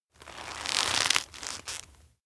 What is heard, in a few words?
touch cheek; anemoi パッケージ版